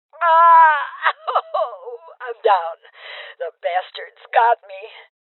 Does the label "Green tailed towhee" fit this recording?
No